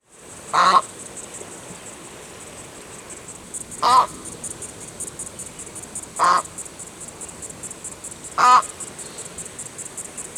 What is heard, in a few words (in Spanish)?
ibis blanco; cantos